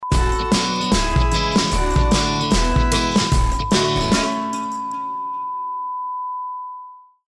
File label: Jingle